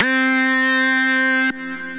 Throaty Blip, Synth Lead; Ableton; Factory Packs; Build and Drop

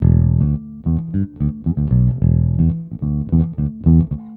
110 Pop 70s Bass Em, E-Bass; Pop Rock

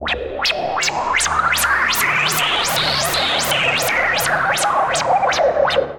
D Drone 160 bpm; MIDI Clips; Tonal; Percussion & FX; Residual; Skitter and Step; Ableton Factory Packs

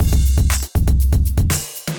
Acoustified Kit, Drums

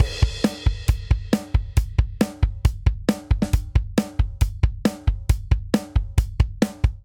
Motorik Verse Variant 136 bpm, Drum; 4 4; MIDI Clips; Ableton; Factory Packs; Drum Booth

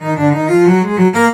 090 South HipHop String Line Ebm

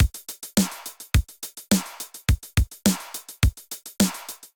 707 Kit groove 105 bpm, Drum; MIDI Clips; Cannibals; Ableton; Drum Essentials

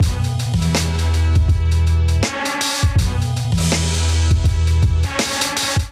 Kit groove 81 bpm, Drums; MIDI Clips; Blueprint; Ableton; Chop and Swing

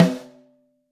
SN2 Top, Snare

